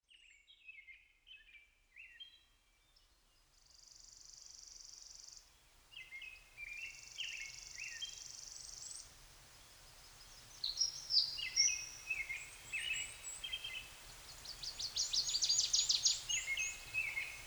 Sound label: healing birdsong natural sounds